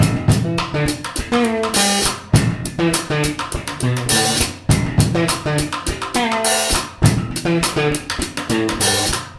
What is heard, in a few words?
loop